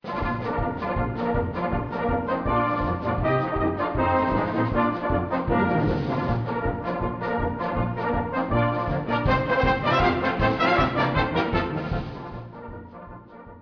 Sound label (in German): Polka